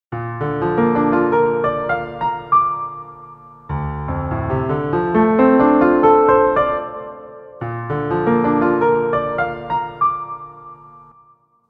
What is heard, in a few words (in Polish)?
pianino; Nagrania Lokomotywy